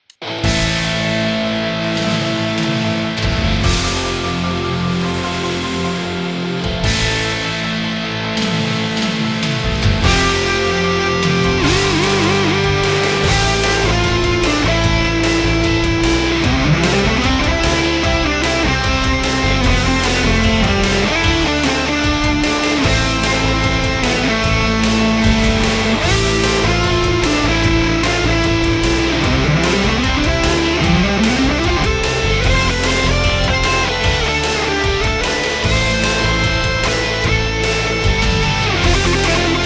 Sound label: guitar